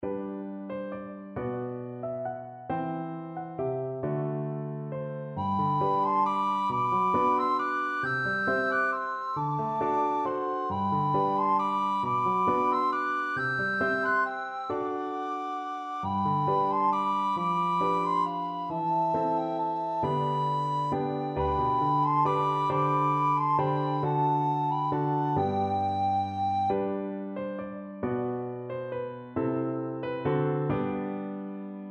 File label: recorder duet